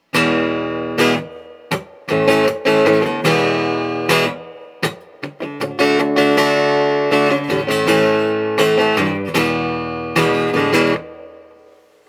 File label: Guild G600 Reverb, amplifiers